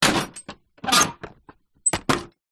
vehicles truck rear door close metal latch turn lock